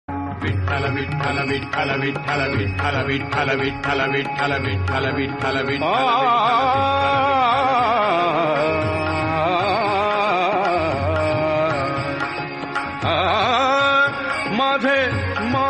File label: Marathi Song Ringtone